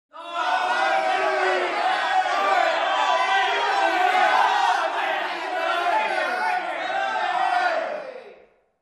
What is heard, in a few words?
Crowd Shouting and Cheering